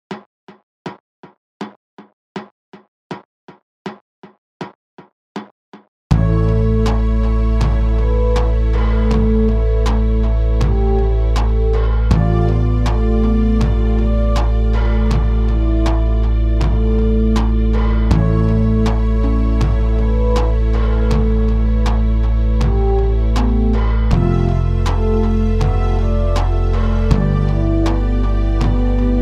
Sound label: Mixolidian